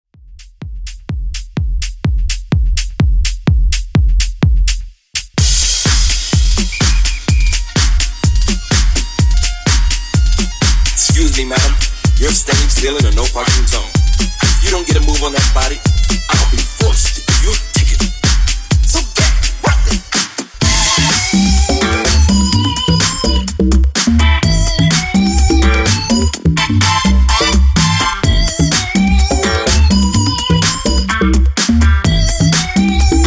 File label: Groove Funk House 126Bpm